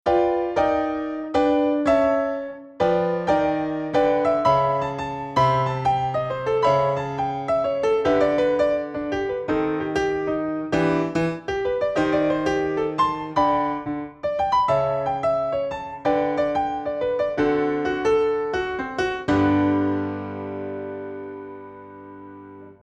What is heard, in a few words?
piano harmony